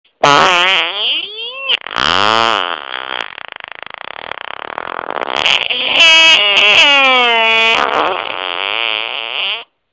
pedo

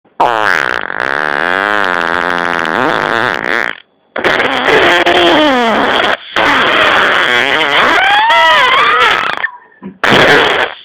Pedo malito

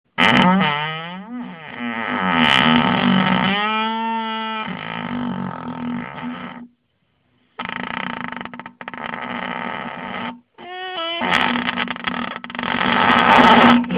Pedo; de la bisbal de falset

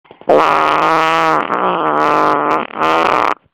Wha-wha sostenido, pedo

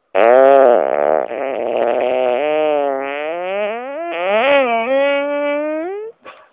Pedo windows

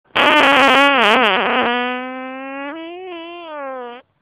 Pedo; de bellvitge

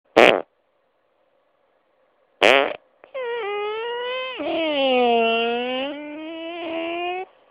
Pedo de habitacion

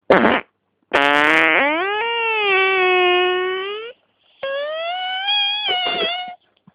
do fa sol fa mi fa, pedo

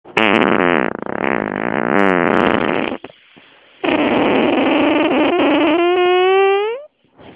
Pedo 2 fases

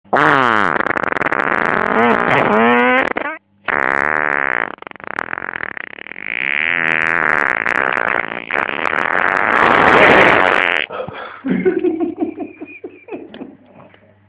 Pedo; de s.julia

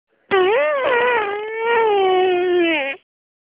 Agudillo, pedo

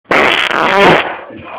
Escandaloso, pedo

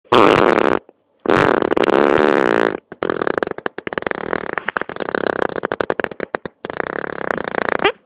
Contenido, pedo

